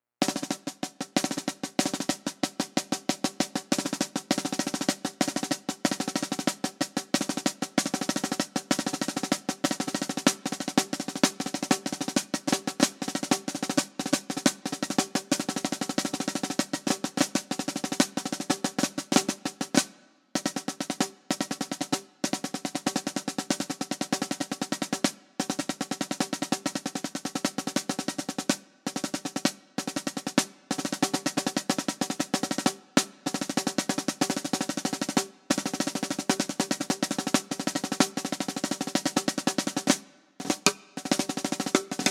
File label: Marching Drum Snare